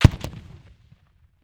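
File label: grenade launch, military; Greytsounds VOL-1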